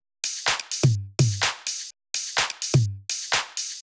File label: top loop after